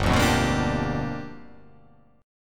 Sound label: G 7th Sharp 9th G chord